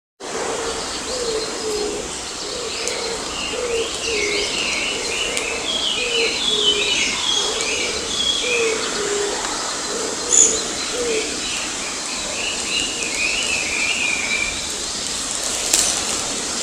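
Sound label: Chinese hwamei; gabicho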